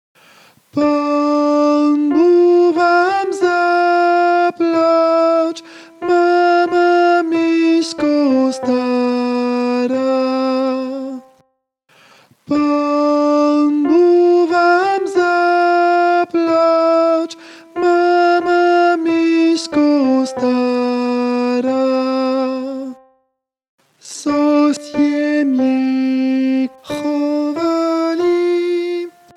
pan buh vamzaplaf alto

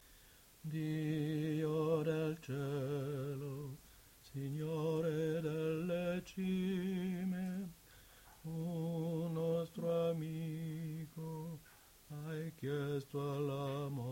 alto

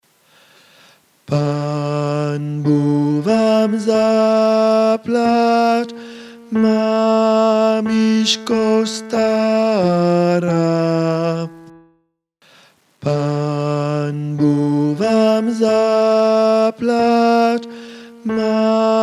pan buh vamzaplaf tenor

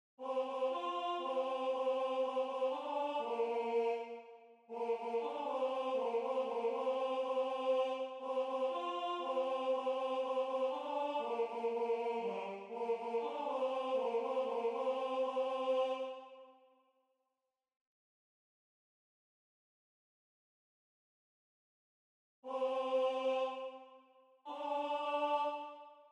ounenaya tenor

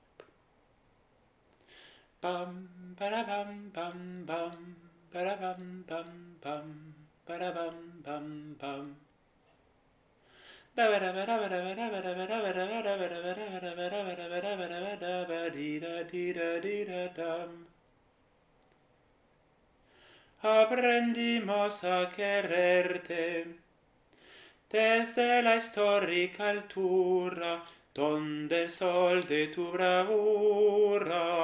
sopranes

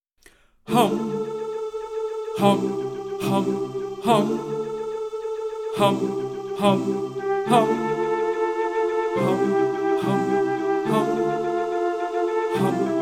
tenors

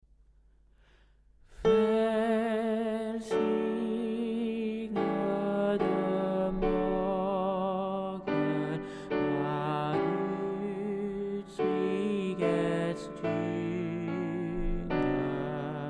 velsignede morgen sopranes

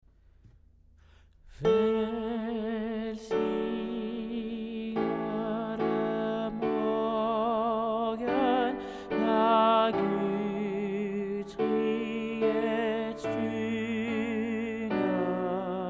velsignede morgen tenors

